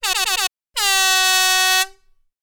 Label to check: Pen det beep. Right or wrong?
Wrong